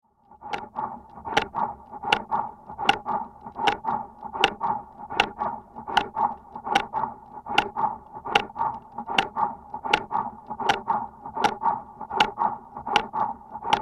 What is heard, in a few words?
Heart Simulator; Interior, Muffled, Run Steady In / Out Breathing With Light Bellow Inflate / Deflate; heartbeats